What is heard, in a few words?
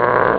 grrr; ST-XX Amiga Soundtracker Sample Packs